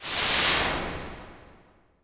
IMP WIPE, Cinematic Impact

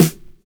VINYL SD, Drums Dance & Groove; USB Soundscan vol.11; AKAI; VINYLKIT

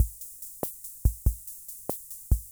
Keynote MR-101 Auto Rhythm Loop

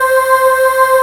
VOX CHOIR LO; CMP - Analogon

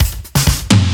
Fills 128BPM, Clubsounds

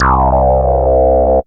SWEEP C1; Best Service Alpha Dance vol.2

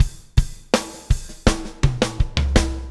BUGA, BUGABOO

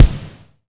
XV Kick, Roland XV-5080

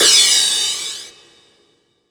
Crash, Club Techno Percussion Cymbal; Zero-G

